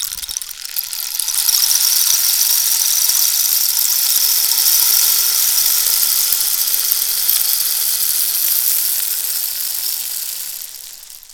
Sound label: African Rain Stick Pou